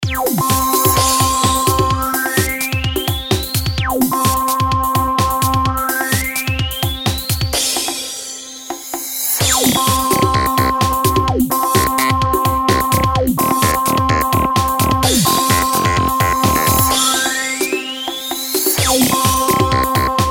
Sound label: kick dark